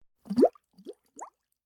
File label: Water Bubbling